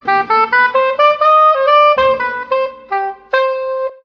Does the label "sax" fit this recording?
Yes